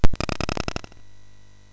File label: SFX, MOS6581; Narco Police; hvsc